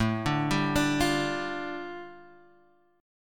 A 7th Suspended 4th Sharp 5th A Guitar Open G, chord